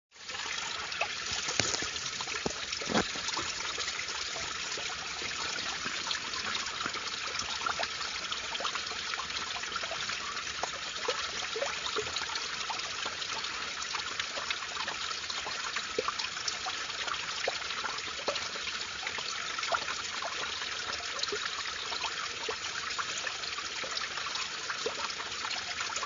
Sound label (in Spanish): Sonido agua